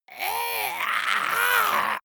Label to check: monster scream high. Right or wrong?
Right